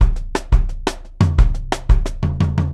kit, bossa dnb; Bonham

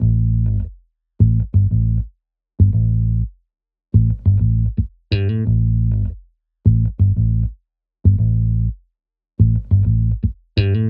88 Am Bass Loop

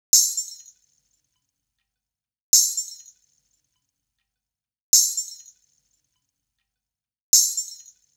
Salangai

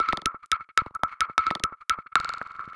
174 CREATURES IN THE MACHINE BREAK

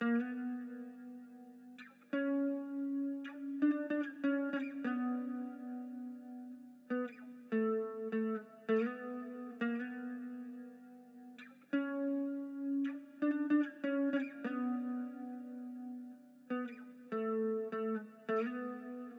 Guitar Bm (Harmonic) bpm 100

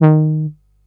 Synth D-3

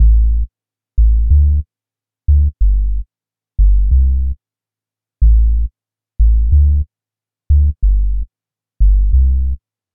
92 loop bass sub layer A min

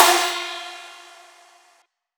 Lead Pluck; Break In

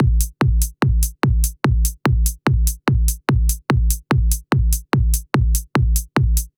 full drum loop 146 glass

